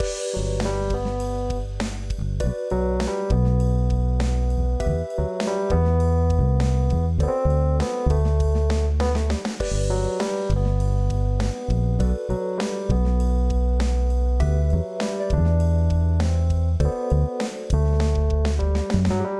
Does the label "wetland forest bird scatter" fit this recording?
No